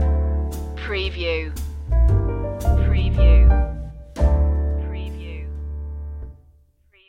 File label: muddy water stinger